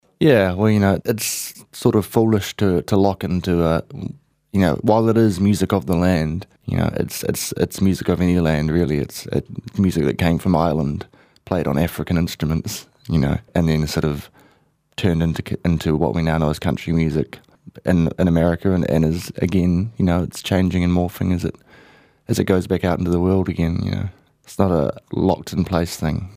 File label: country